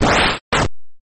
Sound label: Grab; gridtracker